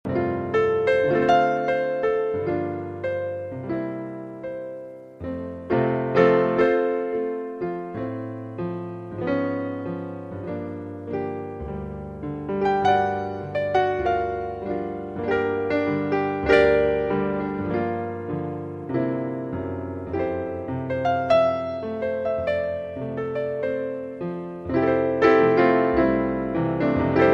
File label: Piano Trax